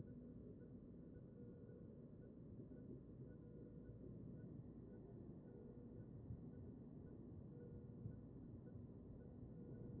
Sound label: A CHEST; B MODE